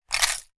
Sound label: pins and needles tip from box